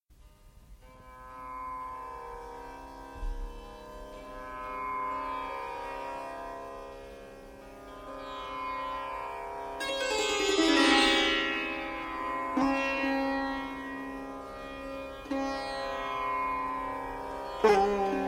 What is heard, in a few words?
raga multani